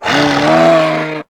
attack; tf; slender; therium

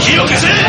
fire

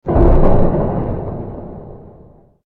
hit; scp 106

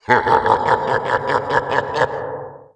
haha; predator

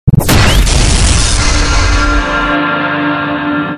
ar2 hit

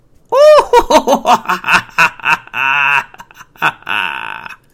laugh; freak fortress 2; graymann

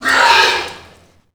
pig chase; A Machine for Pigs

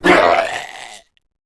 zombie vox male death; tf; slender; rave in the redwoods

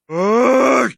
effort; tf

